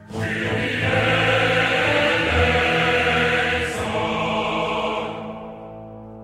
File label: kyrie eleison